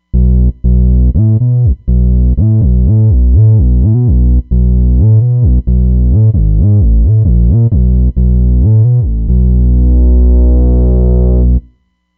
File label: Doze Bass, Roland Fantom XR